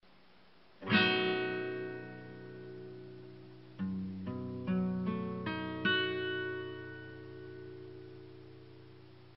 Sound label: Accord H7